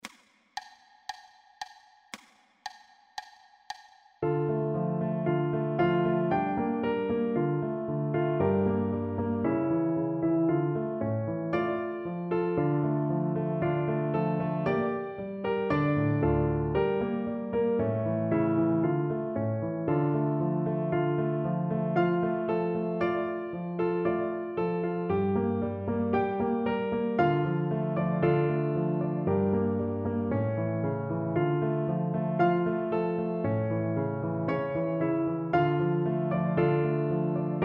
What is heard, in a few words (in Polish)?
orkiestra marsz melodia i akompaniament; orkiestra koncertu niepodleglosci